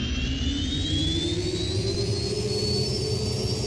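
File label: engine accel Y-wing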